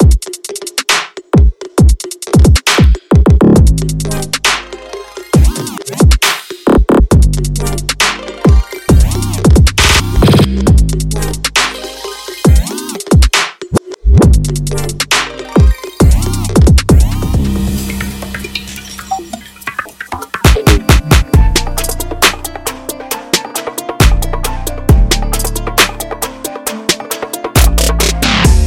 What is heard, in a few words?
Drum; slate digital; SEISMIC